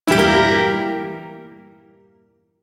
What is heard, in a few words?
Shawzin Phrygian Chord; DE; Warframe; Duviri Shawzin